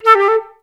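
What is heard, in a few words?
flutes A3; Best Service; Peter Siedlaczek's Advanced Orchestra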